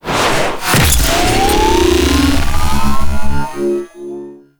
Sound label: Hoverboard Mount; Warframe; Vehicles; Venus